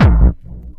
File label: Hard Kick